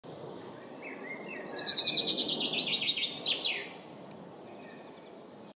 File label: Fringilla coelebs female; سهره خمسه ماده برای جلب جفت